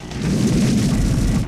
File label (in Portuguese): tronco abrindo; Warden ash